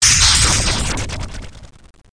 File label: Magic; Total Chaos Nukem 3d Pack; duke